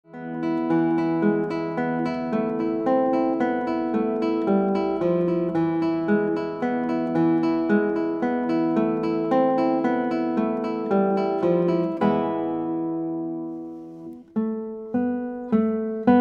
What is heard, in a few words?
Guitar